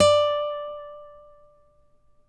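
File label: nylon, FLAMENCO, Guitars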